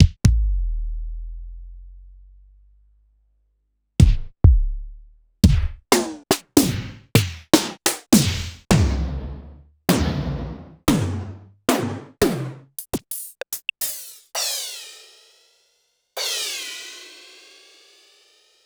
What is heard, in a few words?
Korg 01W Dance Club